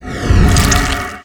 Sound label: Bloodfist; Fly For Insanity